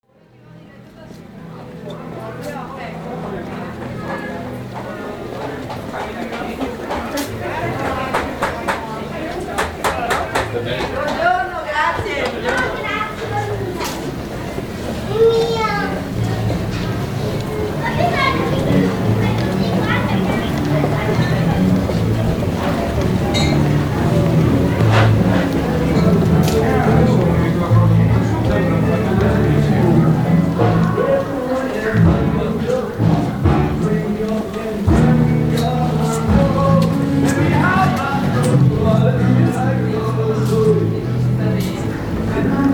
Calle Arco; suoni

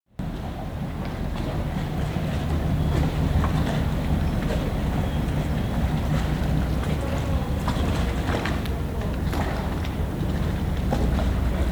Fondamenta Misericordia; suoni

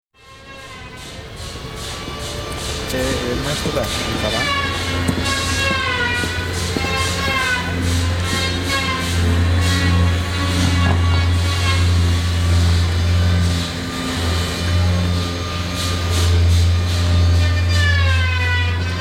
Squerro San Trovaso; suoni

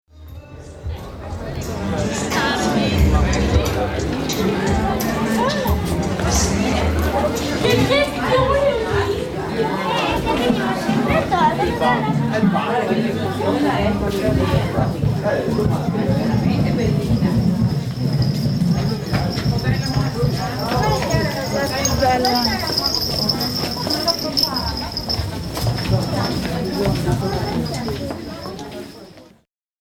campo; suoni; campo San Barnaba

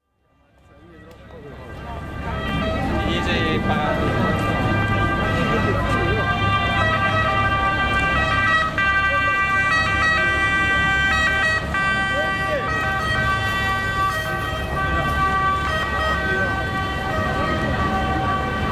Riva Ferro; suoni

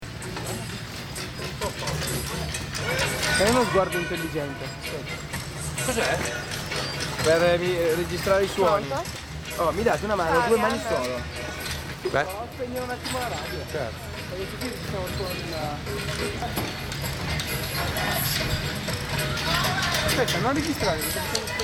Seggiovia

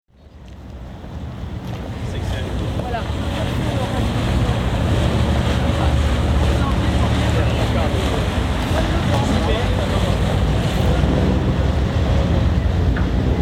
Calle Foscarini; suoni

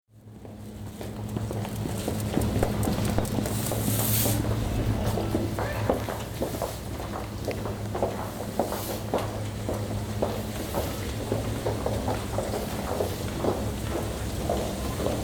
Calle San Rocco; suoni

